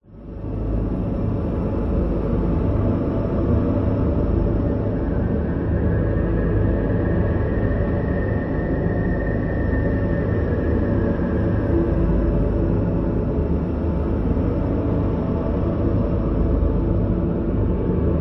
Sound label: science fiction atmosphere planet landscape sinister airy